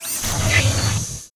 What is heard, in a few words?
WAR IDLE; HOBOMITE